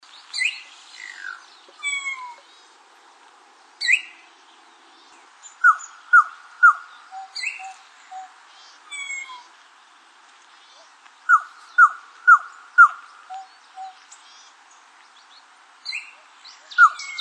voz boyero negro

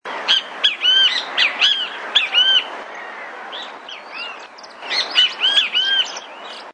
voz benteveo

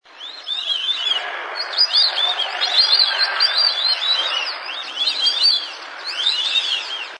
voz sirirí pampa

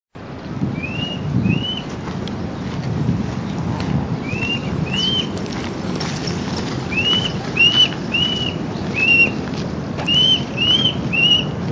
voz calandria grande

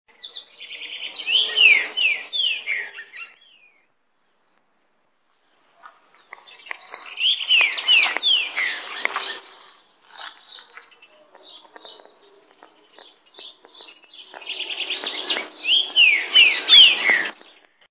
voz pepitero gris

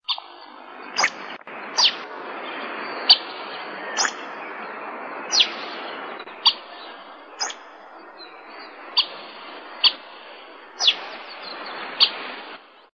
voz frutero negro

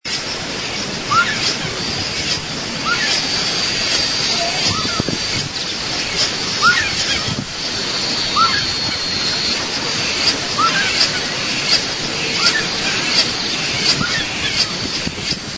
voz ipacaá

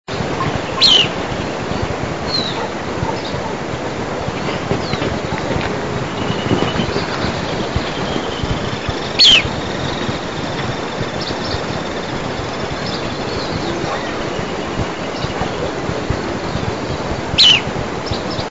voz fiofío grande

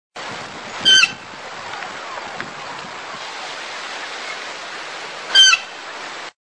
urraca, voz

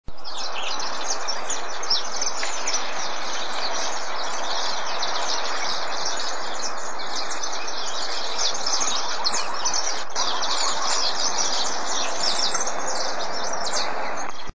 misto, voz

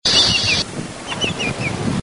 voz pitotoy grande

